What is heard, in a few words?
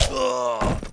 death male; anito